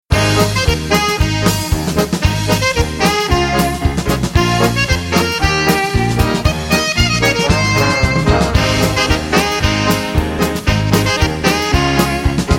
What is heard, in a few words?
Jingle Bells Orchestra; Instrumental